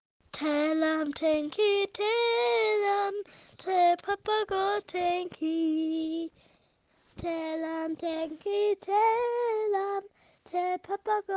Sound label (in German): Krio Lied